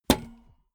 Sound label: bmx bike grab bar heavy